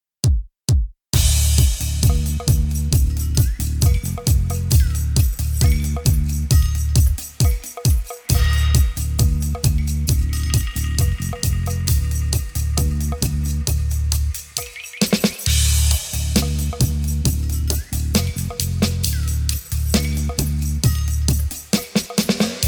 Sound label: Dub; Sattadub Records